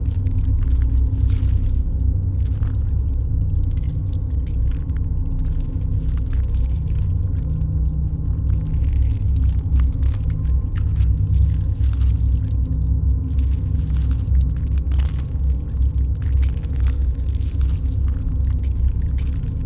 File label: pro ship mining station idle; biogenesis